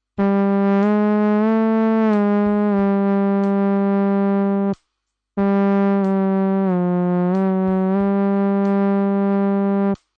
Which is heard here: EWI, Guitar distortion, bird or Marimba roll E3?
EWI